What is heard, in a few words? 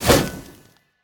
fire; smite; pele